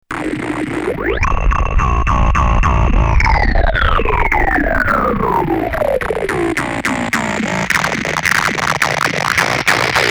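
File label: phase with distortion; Sequencer-->SAS-VCO-->wavetable-->Moogfilter-->Bergfotronphaser; Soundfiles from the modular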